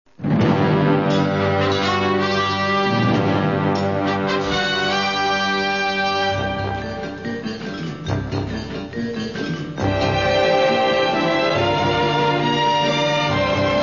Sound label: music; Avengers, The [South Africa]